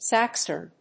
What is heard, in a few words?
saxhorn